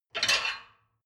dishwasher plate grab

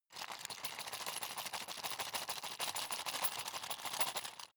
sundries rattle fast; berufe; handwerker; soundpool

